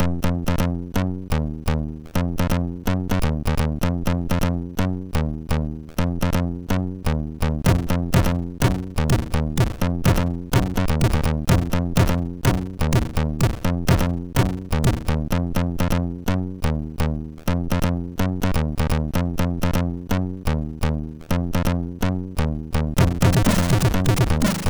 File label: Old Techno sid MOS6581R2